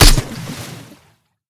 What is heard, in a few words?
shoot; tacz; daffas arsenal